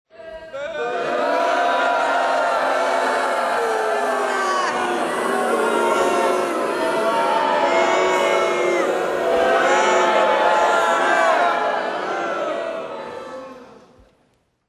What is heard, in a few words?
crowd; WMBonusXP